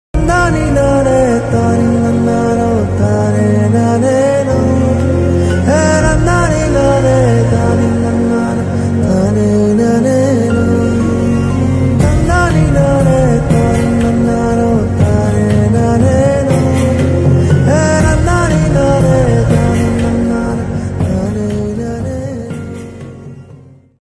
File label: Movie BGM; ringtones; KGF